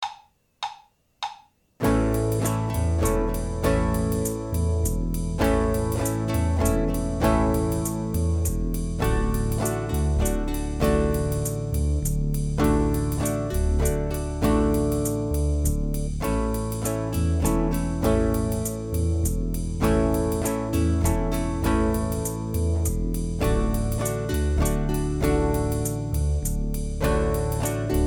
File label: Band; Play along zum Spielbuch Band 1; Begleit-Musik